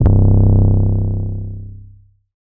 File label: 808 Bass Fort C3; Slate 808 Deep Dive; SD Signature 808s